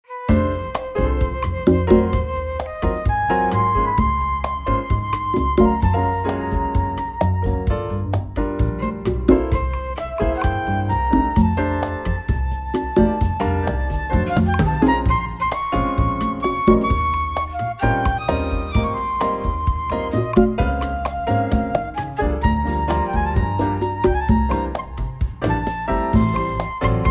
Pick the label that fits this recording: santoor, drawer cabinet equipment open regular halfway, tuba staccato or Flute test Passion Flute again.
Flute test Passion Flute again